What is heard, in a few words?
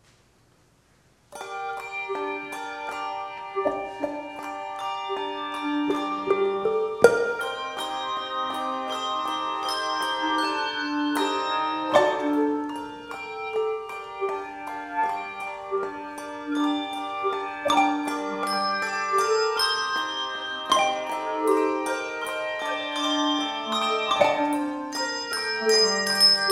anthem handbells